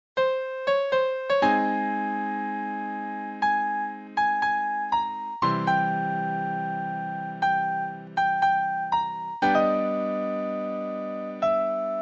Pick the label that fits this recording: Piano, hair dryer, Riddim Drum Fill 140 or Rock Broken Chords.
Piano